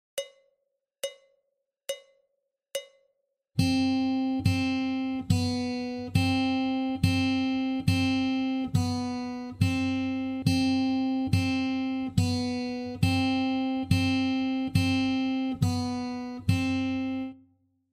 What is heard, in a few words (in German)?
WarmUp C hoch, Gitarre